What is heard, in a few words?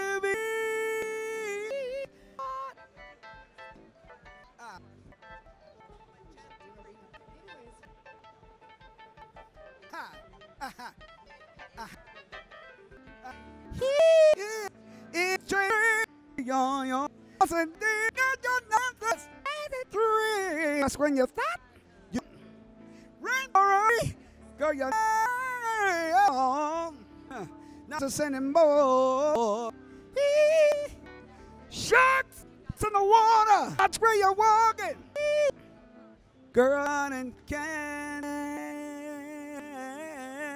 VOCAL; NAMM 2018; MyMix Recordings NAMM 2018; Guitar Recording